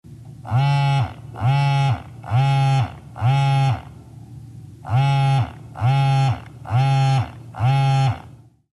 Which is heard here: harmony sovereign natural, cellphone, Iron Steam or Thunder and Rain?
cellphone